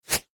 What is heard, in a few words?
transition